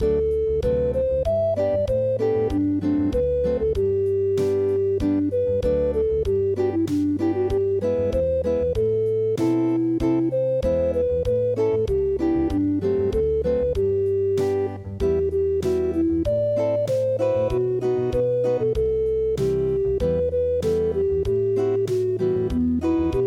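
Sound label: red sarafan unplugged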